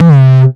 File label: 909 Grime Layer - C3, Kicks; Mbase 11 Kicks; Mbase 11 - 909 Grime Layer 2